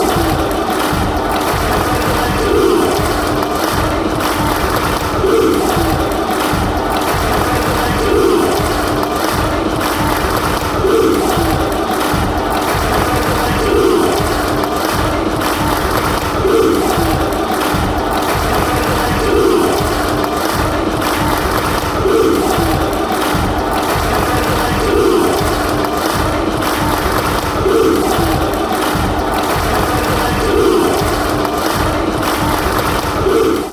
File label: blues clap, fan chants; ccfc